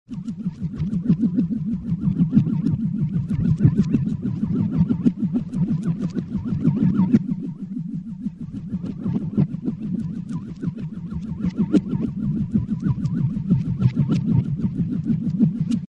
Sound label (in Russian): zvuki volshebnoi fei